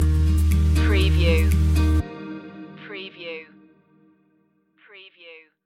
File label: spanish summer stinger